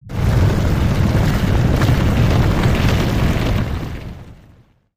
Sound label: magic quake